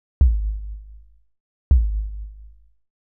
Kick Long; hydrogen

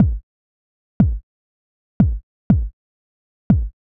Kick; hydrogen

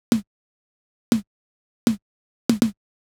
Snare; hydrogen

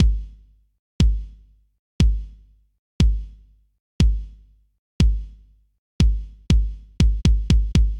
kick 909; hydrogen

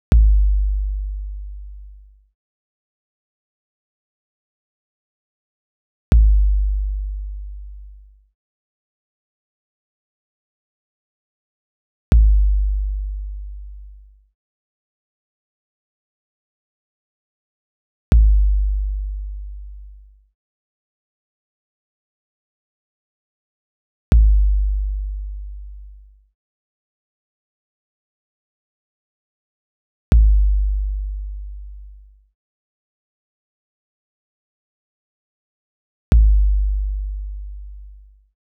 TR-808 Kick Long; hydrogen

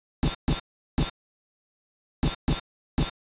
am drum; hydrogen

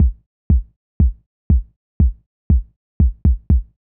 Kick Lite; hydrogen

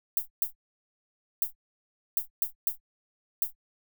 Pedal Hat; hydrogen

